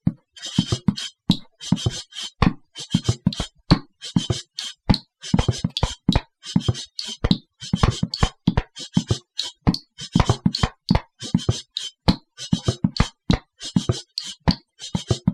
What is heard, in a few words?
Perc; Collabunity Perc Two